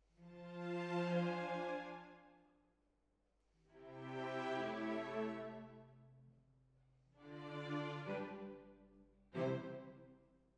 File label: Hall